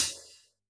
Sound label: Hf Hat, drum